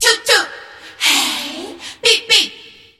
doop doop hey beep beep, Voice; ooh ahh

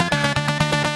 whirlwind; Loops; Electronic

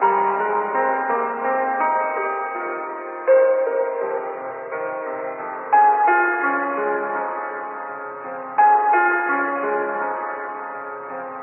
piano, Sad

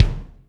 Kicks Medium

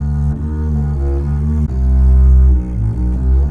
bass

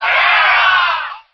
"yeah" audience; ooh ahh

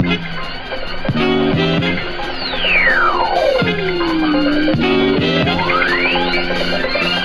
horns trumpet, phonky loops

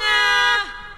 ah SUNG; ooh ahh

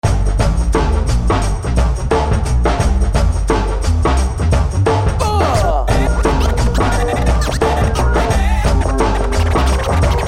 Bonus Scratches; Burntablism Volume 2

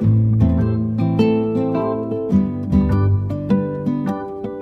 guitar rock steady reggae, loops; Phonky

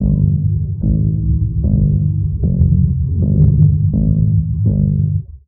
bass, Loops, Sad

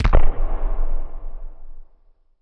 gun shot; Crash Bang Boom

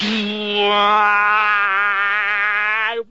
fake gitar, Voice; ooh ahh

